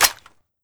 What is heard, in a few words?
g3sg1 clip tap, svt40